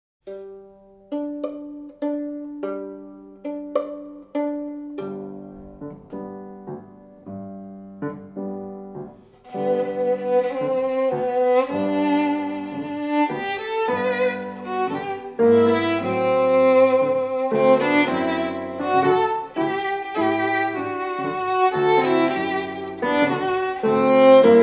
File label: Albeniz Tango